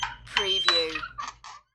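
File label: Door Click Creak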